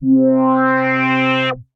Saw Swell, Synth Pad; Ableton; Creative Extensions; Poli

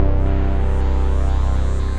Narrow Vein, Ethereal Pads; Ableton; Mood Reel

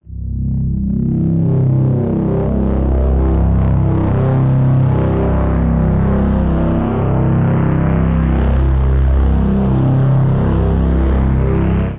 Bass C 80 Bpm; MIDI Clips; Instruments; Dark Art; Ableton; Drone Lab

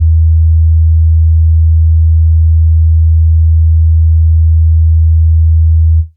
Binaural Drone; Instruments; Laboratory

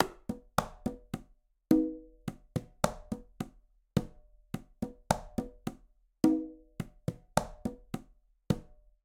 Bolero melodico Conga 106bpm; Latin Percussion; Ableton; Factory Packs

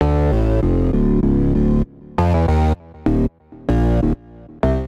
Grind Bass D Minor 94 bpm; MIDI Clips; Bassline; Ableton; Factory Packs; Chop and Swing

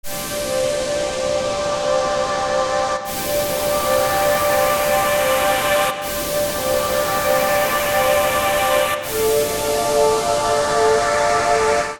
Space Pad C Minor 80 bpm; MIDI Clips; Tonal; Accompaniment; Ableton; Factory Packs; Chop and Swing

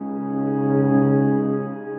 Reverse Piano Pad; Ethereal Pads; Ableton; Mood Reel

